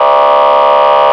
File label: police, horn; tuning